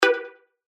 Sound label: Synth Shot G; synth shots tech house; Candy; revealed